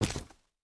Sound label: Smol Man Walk